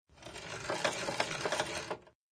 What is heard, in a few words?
crank; doctorwho1200